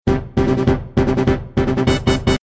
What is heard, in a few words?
Won Game Fanfare; Zelda